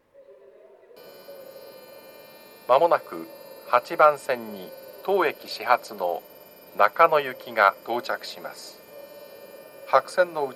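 nishi funabashi departure nakano commuter rapid rush, station; tokyo-metro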